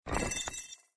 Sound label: Collect mineral